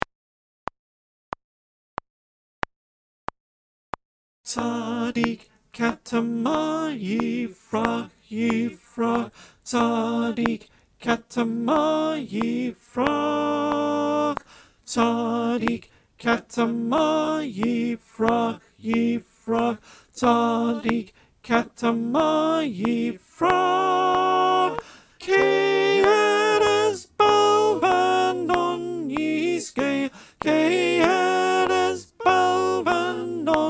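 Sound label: Alto clicktrack, choir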